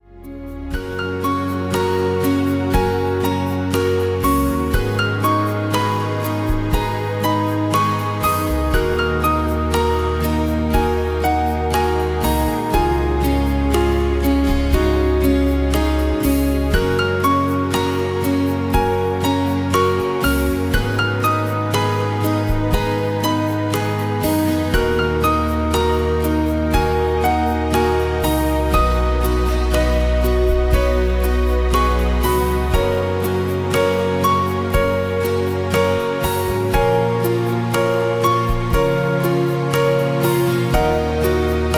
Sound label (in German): Entspannungsmusik mit Klavier